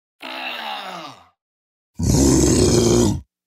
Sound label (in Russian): ryk malenkoy i bolshoy mumii